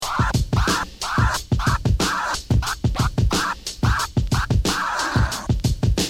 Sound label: forward beat